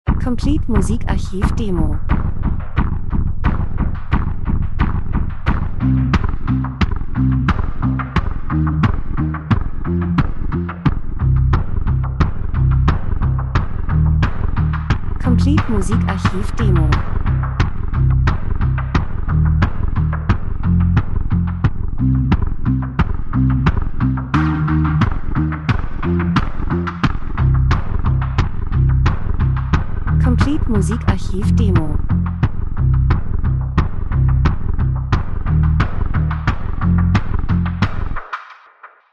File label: drums; Traditioneller Rhythmus verfremdet Indianer Bass Fremdartig Gleichförmig Andersartig Besonders Offroad Reisefilm Lifestyle